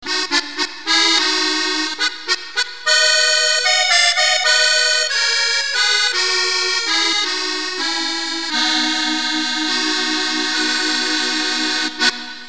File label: French Musette solo; KeyStar